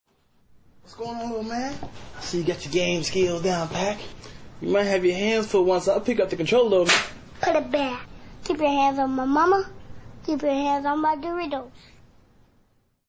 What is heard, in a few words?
kid slaps man